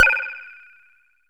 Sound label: FM, synth fx